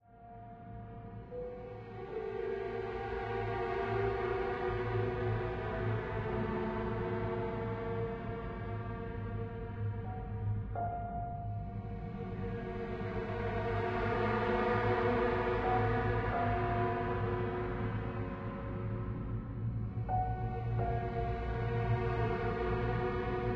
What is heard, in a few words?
Dark Piano